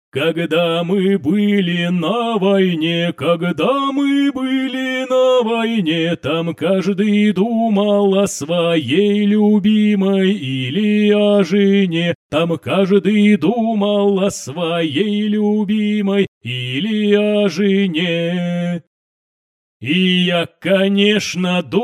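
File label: Russian folk vocal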